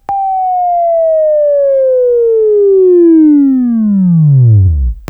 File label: WAV Right, Sweeping